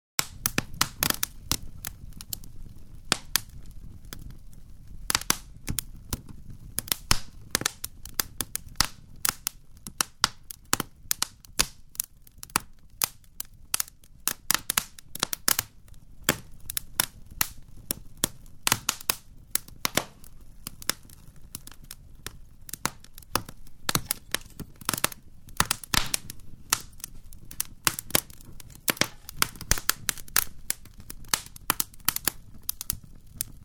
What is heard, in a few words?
Crackling, spitting open fire; Fireplaces (Sound Effects:Household)